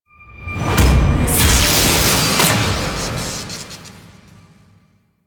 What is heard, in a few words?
Anger; Ballas; Warframe; The New War; Intro Throne Room